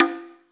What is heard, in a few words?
Perc, Amapiano; Drums; Afro Drums; One Shots; Ghosthack; Drum Mega Bundle